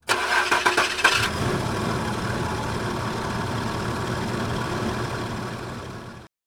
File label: Starting car